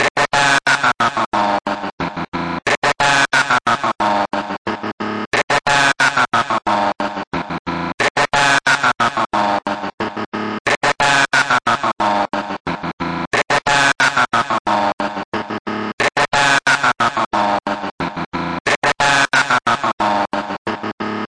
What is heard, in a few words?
109 down fall distorted voxy bass; VOCAL OR VOXY LOOPS